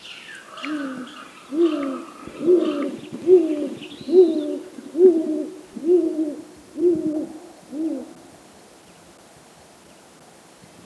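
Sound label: Great Gray Owl Full Call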